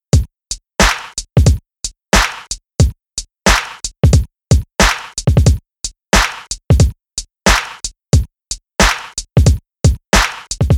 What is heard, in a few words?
Septuplet Swing with Kick Stutters